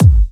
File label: Bassdrum; Vengeance Samples; VEE Bassdrums